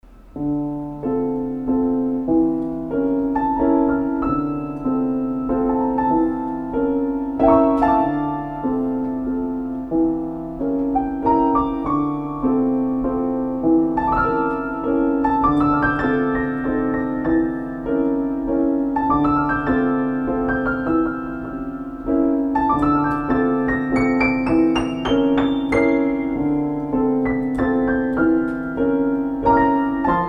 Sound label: Droplets Improvisation